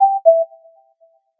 Ringtone

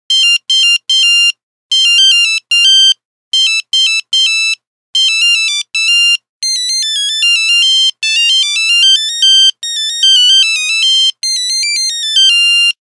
3210 Hat Dance, Nokia Buzzer; Windows Phone apps

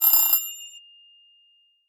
Analog Ring, notifications; Samsung; Galaxy Watch 8

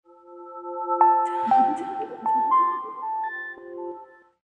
Samsung Power On; Galaxy K SHW-M130K; ui

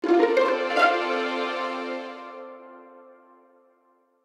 Orchestral alarm; Nokia; audio themes

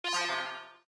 effect low battery; Lenovo; K900; ui

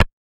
Keypress Return; Explay; Bit

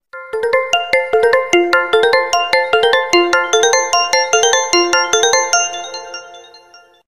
Crescendo, alarms

